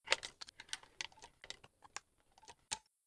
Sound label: mp5 fidget, weapons